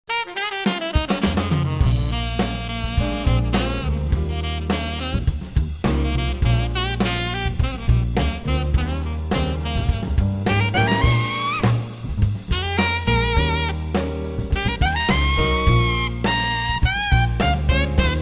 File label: Jazz Standards